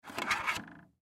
Dvd Player Remove, Dvd Player Remove Disc From Tray; Technology; Blastwave